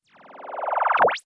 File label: Drip buildup